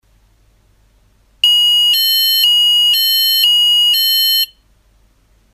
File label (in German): Alarm Lioresalpumpe